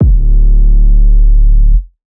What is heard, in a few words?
808s